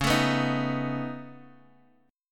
Db 7th Suspended 2nd Sharp 5th Db, down, Guitar Open D